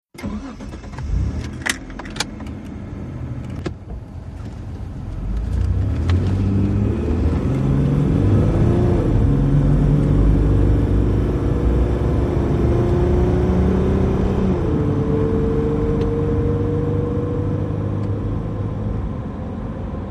Montero Fast OB Road, 1998 mitsubishi montero int.